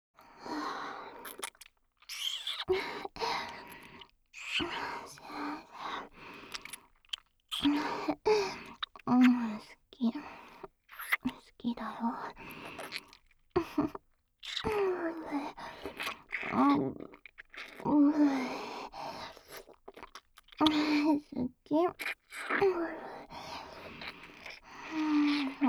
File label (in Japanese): キス; カレン